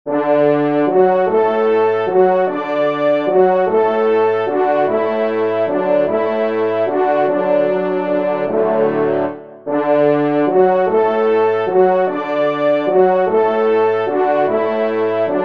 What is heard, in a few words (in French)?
Variation Pour Trois Trompes; ENSEMBLE; Gustave Rochard